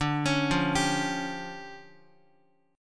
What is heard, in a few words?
D Minor Major 11th, down, Guitar; DmM11 arpeggiated; JGuitar